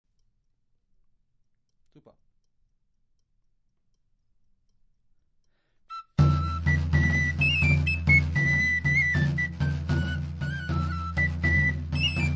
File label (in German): hoboeken